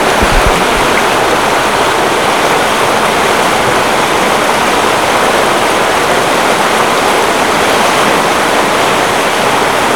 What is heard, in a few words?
Water Ful Loud